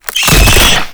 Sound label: Weakpoint Appear; Warframe; Nineteeen Ninety Nine; Infested Survival